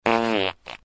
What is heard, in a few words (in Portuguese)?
peido, horns